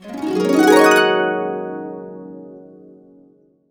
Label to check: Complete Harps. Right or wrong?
Right